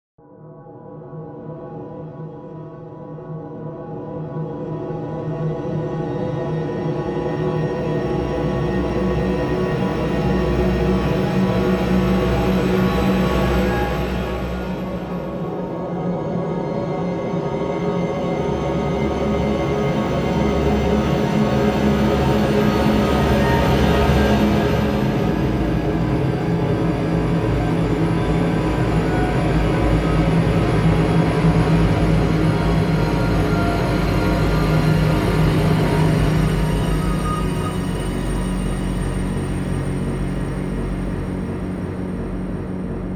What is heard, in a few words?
Tension build to disturbing pulse